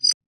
terminal, sci-fi; sci-fi sfx

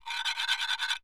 Rubbing Metal on Plastic

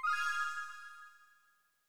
confirm, tones; inteface sfx pack 1

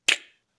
bug, creature

